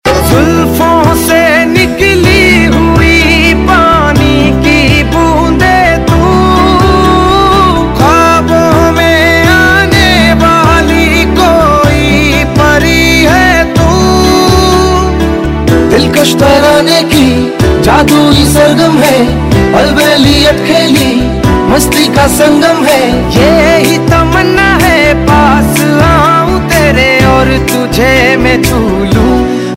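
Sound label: Ringtone, Bollywood Punjabi Pop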